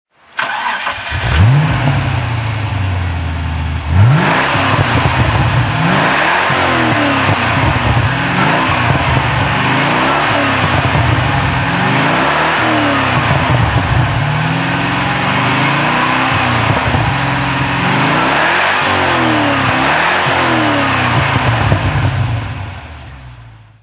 mg rv8 throttle

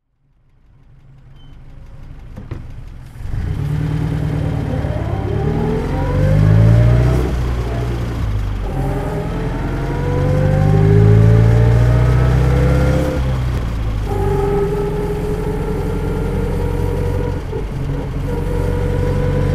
lagonda 2L continental driving

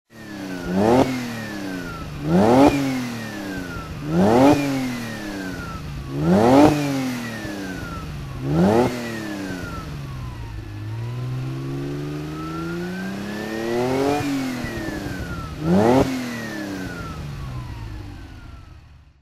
ferrari 246gt dino throttle

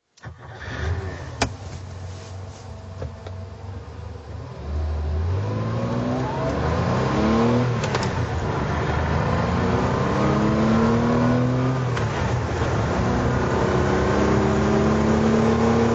porsche 911 24t drive

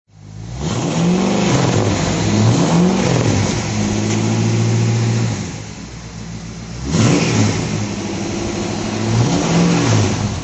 chevrolet impala ss

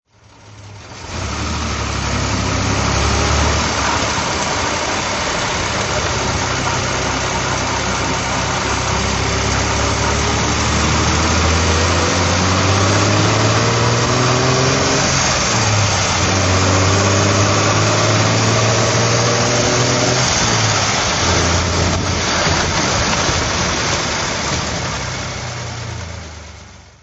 lagonda drive

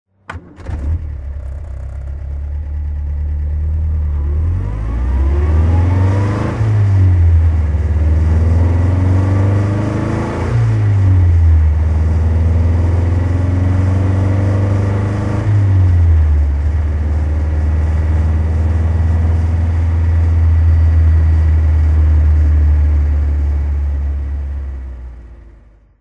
austin healey driving

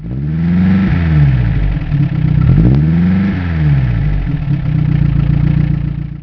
mgtc

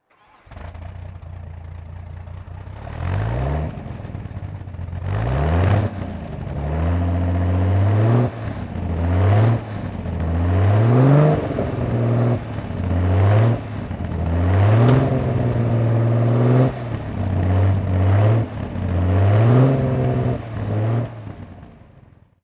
healey special red throttle

